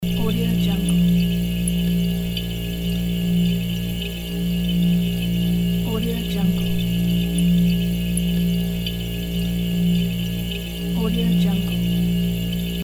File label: fridge